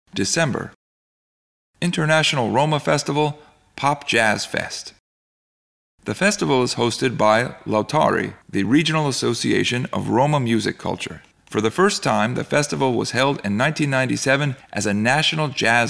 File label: Pop Jazz Fest